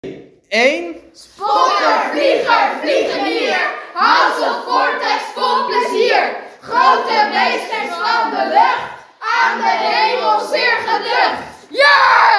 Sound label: Yell; Geel Vortex